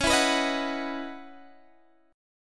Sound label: Db Minor 11th Db